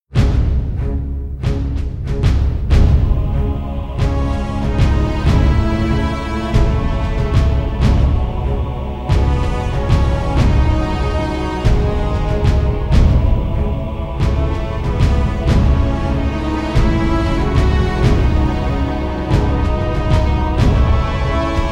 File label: bgm, RockMetal